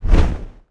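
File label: fell beast flap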